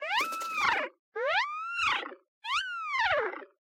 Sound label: alert; Stray; Zurg